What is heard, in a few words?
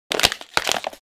Crushing a plastic bottle before throwing it in the trash